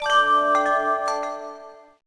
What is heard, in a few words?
oyster Pearl; Feeding Frenzy